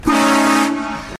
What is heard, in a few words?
Train Horn blast sms